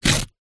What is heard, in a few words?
foley paper rip